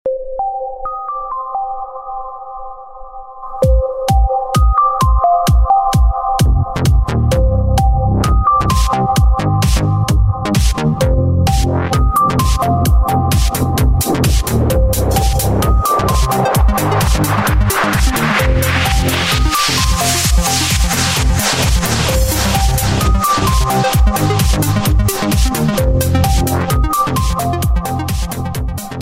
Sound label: Groove